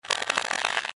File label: rope swing; Danger Mouse - Ultimate